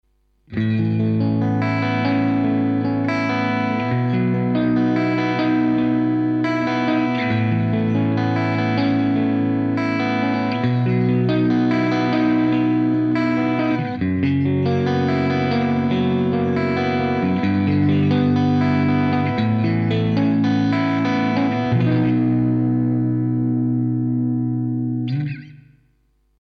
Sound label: TwoNotes OPUS Clean; Preset 23 Clean Pretender